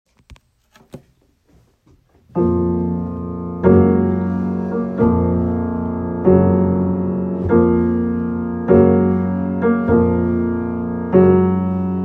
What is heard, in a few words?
Funeral March